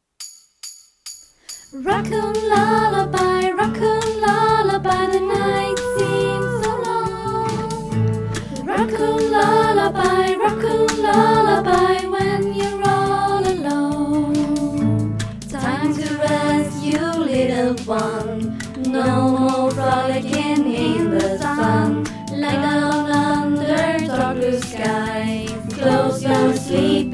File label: racoon lullaby